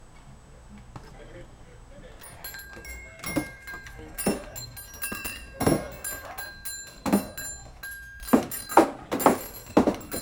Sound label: cozy santas workshop